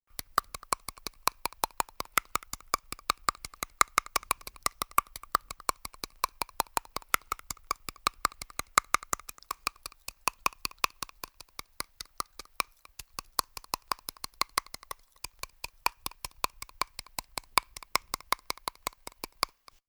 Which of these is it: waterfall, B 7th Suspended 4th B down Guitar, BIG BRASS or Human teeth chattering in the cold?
Human teeth chattering in the cold